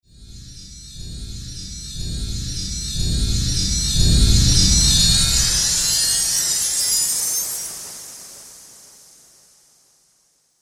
Fantasy Spell, magic sound effect

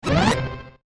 Ascend Short To Hit; Blastwave

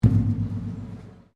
METAL IMPACT BONG; Materials

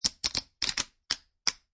Poker Chips Stacking

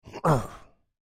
Creature Effort Wince, Human; Breathing

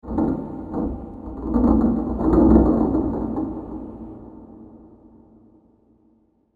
Nothing Sleeps In This Dungeon, evil sound effect; fantasy sound effect; Andrew Fleming

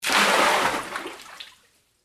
Water Submerge Object, Large, Pitcher; BlastWave

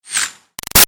Arrow By Airy Shimmer Fast; Bows and Arrows; Combat; Big Room Sound

